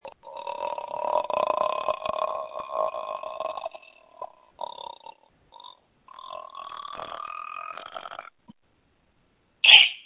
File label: screams